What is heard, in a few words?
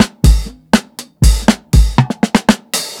120 FILL, DRUMS LOOPS; BASEMENT DRUMS VOL 2